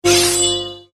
sword

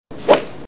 golf shot

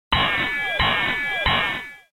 button

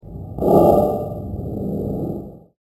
Arenal Explosion rev

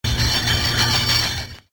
Good Humor Truck

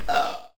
burp

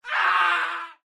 man scream short

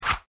mp5 fire

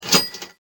cash

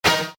chime

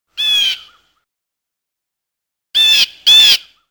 bluejay

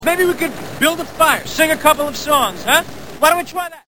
build fire

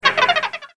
droid

